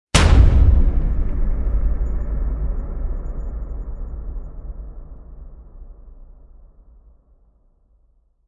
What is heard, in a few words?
Hit Epic; Ninja Tracks